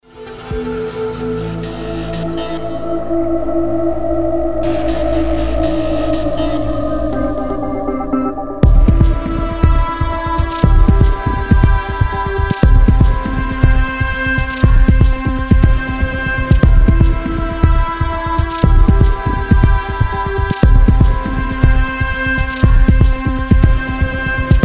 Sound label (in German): Demo Song Ausschnitt